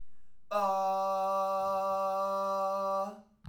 G3 chest, Chest voice

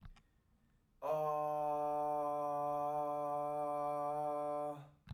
D3 chest, Chest voice, singing

